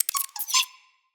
Misc Squeaks, Animals, Vocals; Floppet

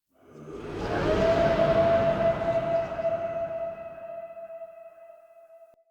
Whoosh In; Cub Diorama A; Cinematics; Orengall Quest

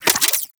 Briefcase Akimbo Pistol Reload Passive Complete; Warframe; Weapons; Corpus

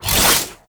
Whoosh, weapons; Broken Frame Warfan; Quassus Prime

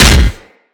Impact Metal; Weapons; Furax

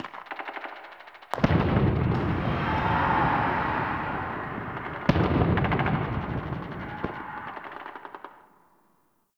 Ambient Distant Infested Explosions; Nineteen Ninety Nine

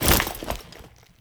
Foley Step; Warframe; Kahl

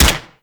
Infested Swarm Rifle 2D Shot; Shock Swarm Rifle; Weapons

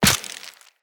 Walk Bone, Footsteps; Operator

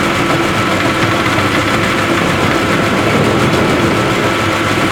Fortress Platform Loop; Ambience; Gameplay; Warframe; Grineer

